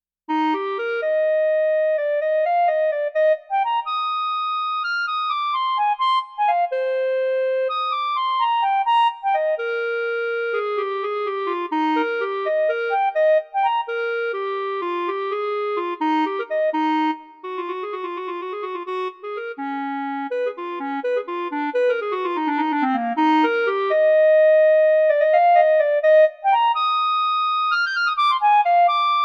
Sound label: Eb Clarinet Technical